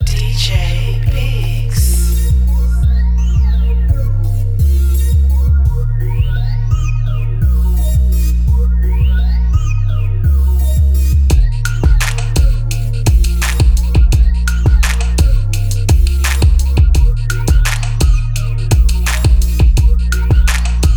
Cello

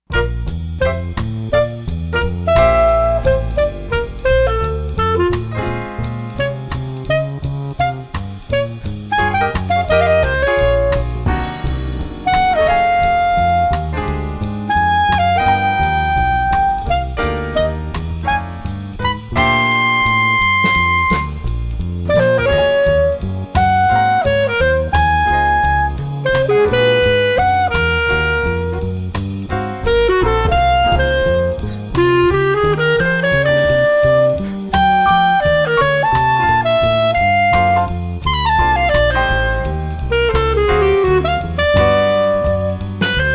Jazz Etudes

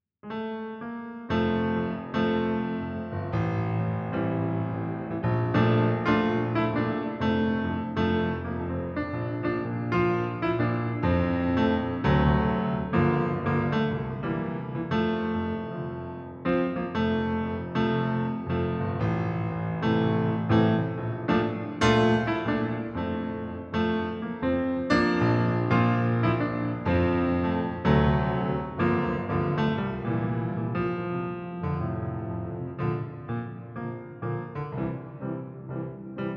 Piano Bar

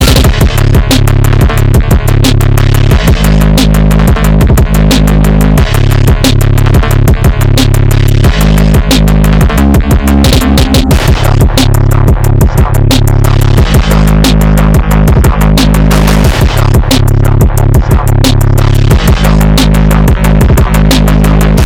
PHONK

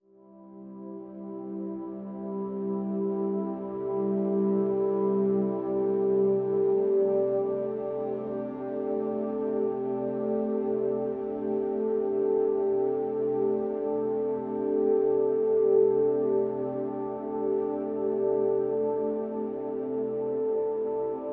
Native American Flute

